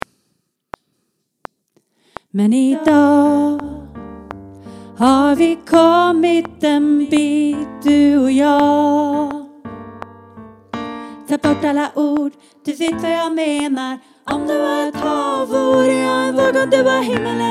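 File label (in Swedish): refr Bar, seniorkor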